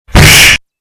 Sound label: punch, sound effect; パンチ; 効果音素材